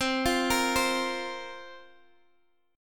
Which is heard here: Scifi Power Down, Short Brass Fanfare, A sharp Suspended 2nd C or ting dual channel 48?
A sharp Suspended 2nd C